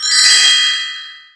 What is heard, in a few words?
drop effect